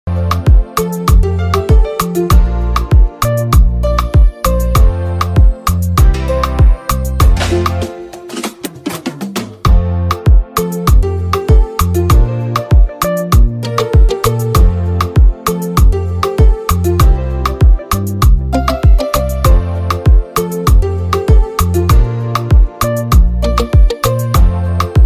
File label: Regular Instrumental